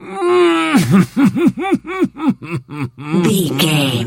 voice male laugh

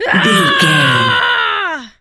voice female battle shout

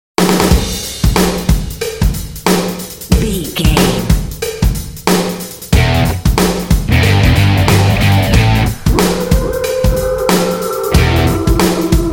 powerful blues rock gritt vocal

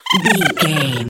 goblin fairy laugh high

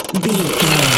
gun auto barrel spin med start

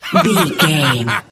voice male laugh short

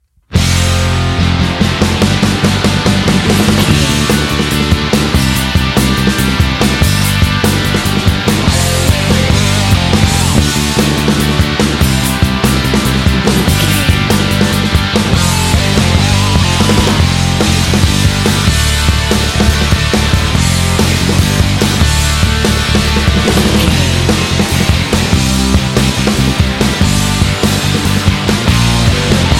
pop

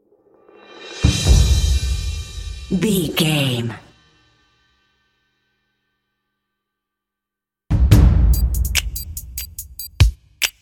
dramatic decision countdown percussion

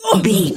voice female hurt pain

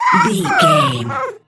goblin fairy death

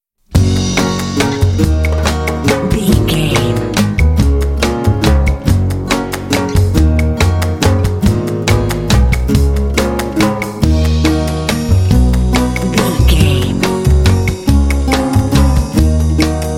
quirky tiny waltz bed

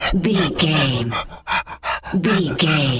wolf breathing

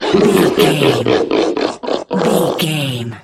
troll monster laugh high

